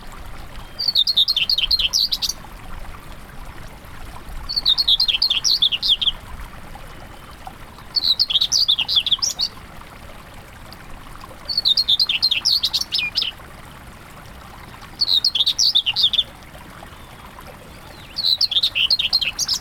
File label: whitethroat common Sylvia communis